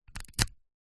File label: household usb lead socket plug in plastic wall socket